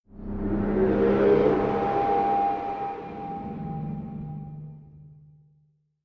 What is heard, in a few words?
Animal Stinger Roar